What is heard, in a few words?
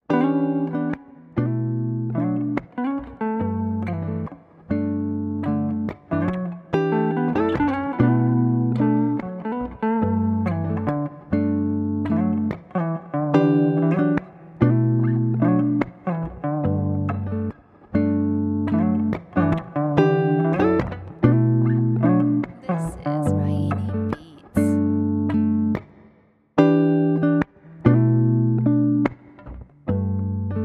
GUITAR INSTRUMENTAL